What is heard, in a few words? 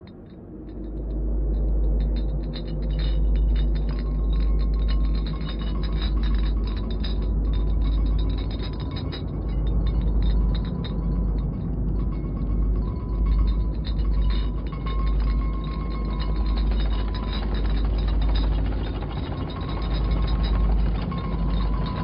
Earthquake